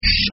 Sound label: close; hinged